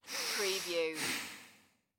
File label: Breath Inhale Exhale; sound-effects-bible